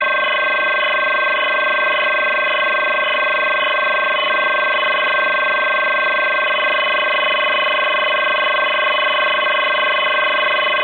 CIS 3x100 VFT 100bd HF USB

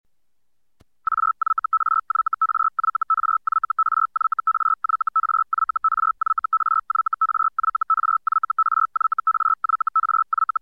mode Feld Hellschreiber

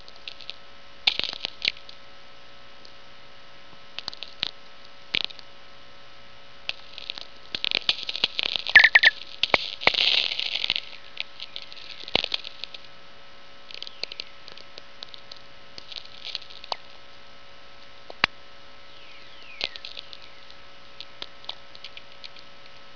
vlf whistlers sferics at dawn; mcgreevy

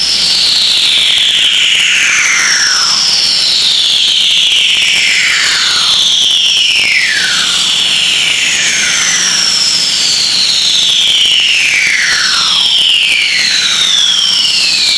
Jupiter S burst; ufro

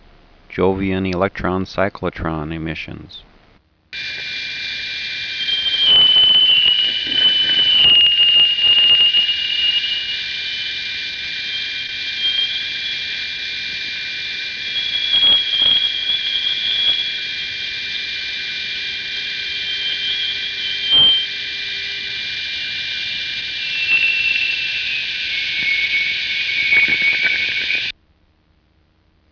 jupiter electron cyclotron emission; uiowa

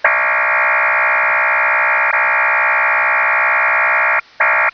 mode Clover 2000 QPSM; xclover

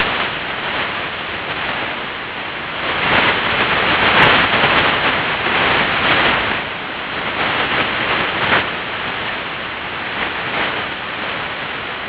io b storm l burst; radiosky

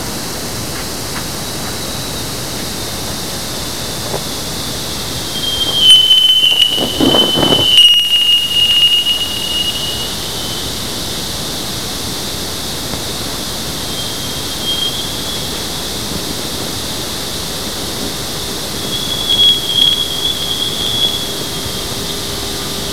jup outer magnetosphere

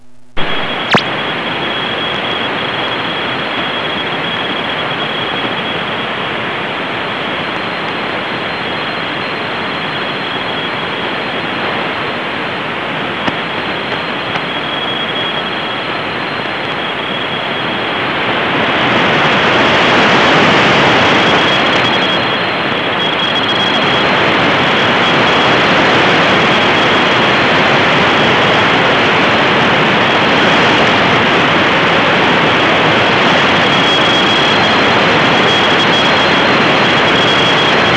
sun burst radiojove; 20mhz

